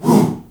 HOO, SHOUT; Spectrasonics; Heart of Africa 1; CH. SHOUT1

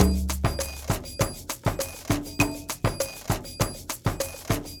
Ensemble, Latin Percussion

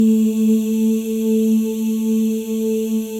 M+F EES; Spectrasonics; Symphony of Voices

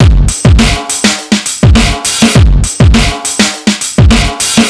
Distorted Loop, Drums & Percussion, Electronic

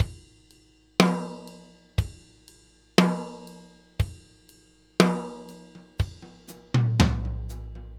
Laid Back New York Loop, Drum; Sonic Foundry (Sony Creative Software); Loops & Samples Drum Tools

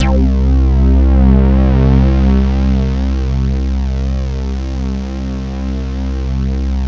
MEG, synthesizer bass; Synthesizers; Soli-Music; World of Synthesizers VOL-1

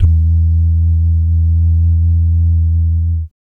BS DUMMP; Spectrasonics; Vocal Planet; Jazz FX

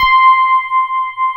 FM ELP C5 L, Keyboards Old School; USB Soundscan vol.09; AKAI

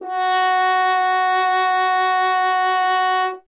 F. Horn; Miroslav Vitous; Solo Instruments VOL-2

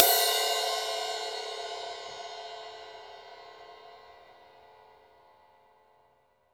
RIDE, Drum Dry; East Collexion; Drum 1 Dry

